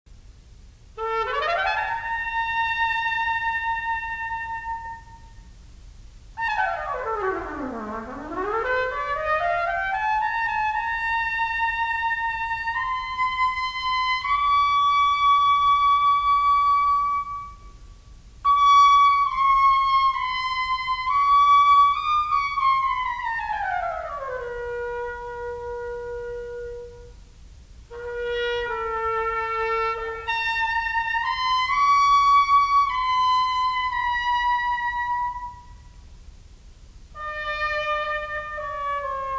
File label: Music for Trumpet Strings and Tympani